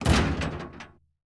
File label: door double metal close end; Rust